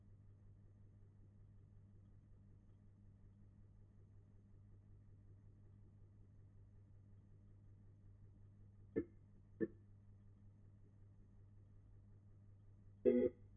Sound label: Hammond